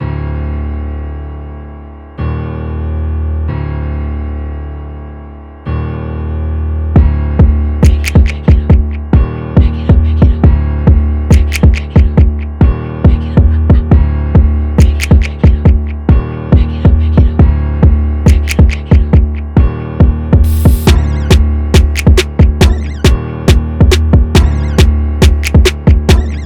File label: Instrumental